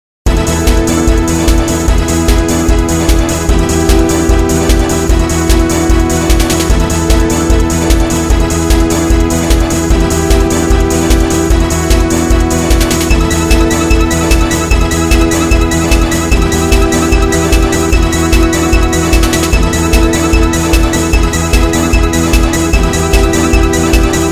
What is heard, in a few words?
battle; skeleton captain battle